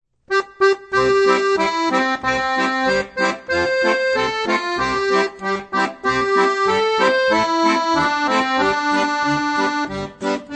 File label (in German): Bassbegleitung in Achteln, Akkordeon; Akkordeon Workshop Band 1